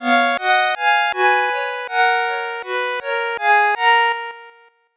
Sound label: 8bit jingle normal